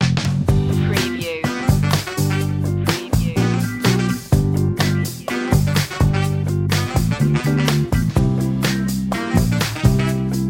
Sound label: Short edit for looping. A quirky and easy going track, with gro; funky groovy